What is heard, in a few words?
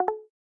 win Amount; fruits